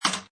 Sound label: Pinball Sound Impact With Loose Metal Plates